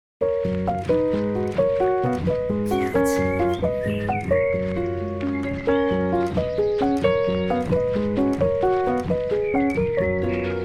Jolly Birds in Trees piano; nature relaxation

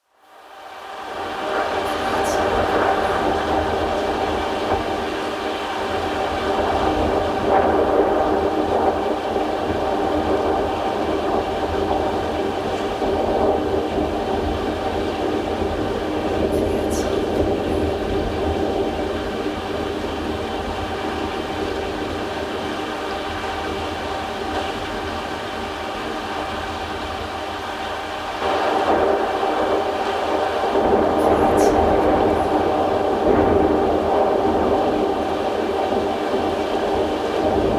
Rain on Jupiter nappe seule

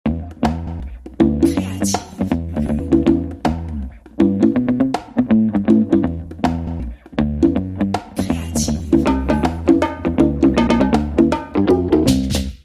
funky

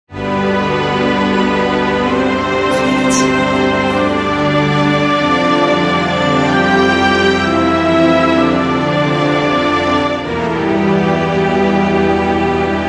Adventurer orchestre

